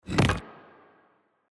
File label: reel Spin stone Land; Champions of Valhalla